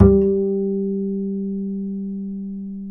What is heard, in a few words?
G 3, Bass; Spectrasonics; Bass Legends